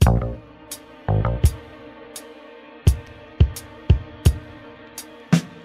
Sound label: bass, Loops